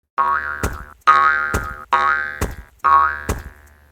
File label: engage; easter; holiday themes; frogpilot